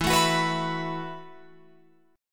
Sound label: E Suspended 2nd Suspended 4th E, Guitar, Open F; Esus2sus4; strummed